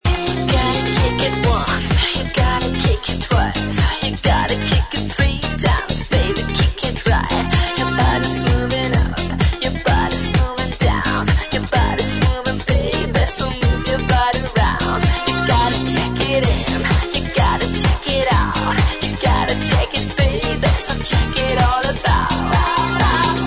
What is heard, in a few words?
kick